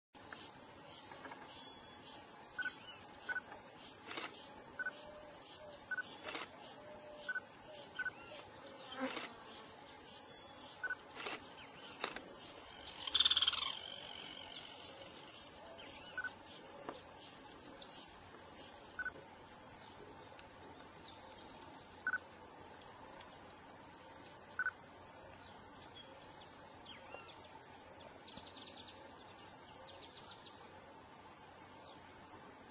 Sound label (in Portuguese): Canto do sana de cara ruiva